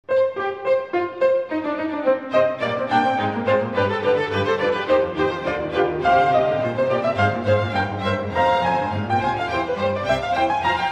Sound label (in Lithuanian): Koncertas dviems fortepijonams; Bach